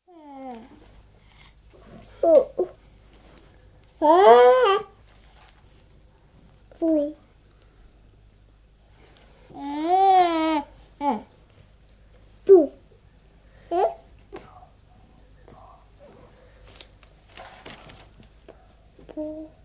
13 month old baby girl, muttering while looking at book; Babies; BBC